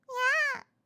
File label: meow, Catgirl; Catgirl Fighting SFX